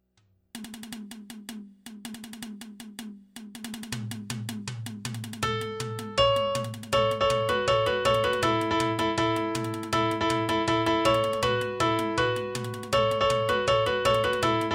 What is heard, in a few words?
All Parts 160